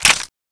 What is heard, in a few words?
clip out; groza; tfa cso